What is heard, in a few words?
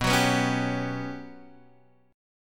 G Major 7th Flat 5th